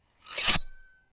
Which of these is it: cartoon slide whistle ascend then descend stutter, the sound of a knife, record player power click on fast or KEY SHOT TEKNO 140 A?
the sound of a knife